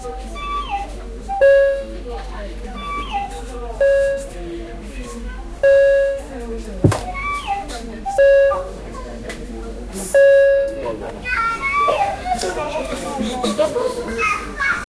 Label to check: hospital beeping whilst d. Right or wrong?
Right